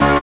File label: SYN, dance; Sound & Vision - Gigapack II